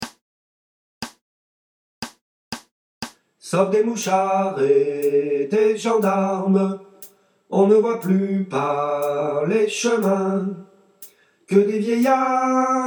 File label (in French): Trois voix, chorale; semaine sanglante